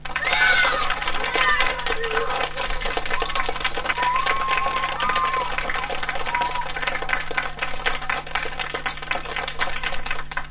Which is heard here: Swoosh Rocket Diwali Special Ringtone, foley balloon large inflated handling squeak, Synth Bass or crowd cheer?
crowd cheer